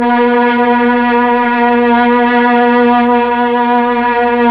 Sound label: STR, Melo. Strings, Slow Strings; Roland LCDP09 Keys of the 60s and 70s 1